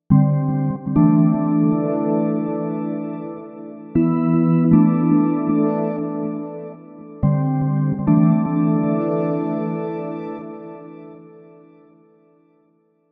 Octa Chord

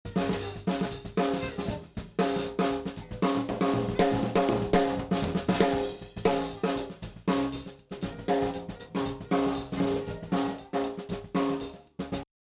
Roland Snare Heavy Comp Gate

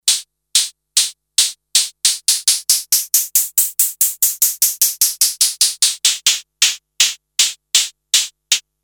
high hats

